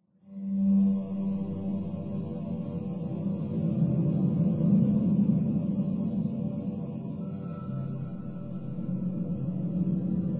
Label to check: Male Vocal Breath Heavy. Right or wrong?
Wrong